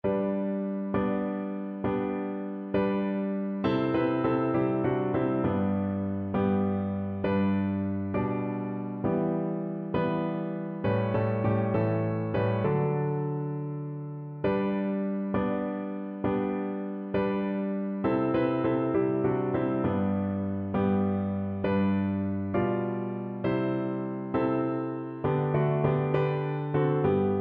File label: praise him piano